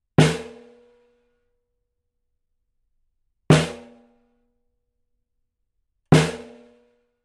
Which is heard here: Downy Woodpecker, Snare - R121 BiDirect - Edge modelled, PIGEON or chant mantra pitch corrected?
Snare - R121 BiDirect - Edge modelled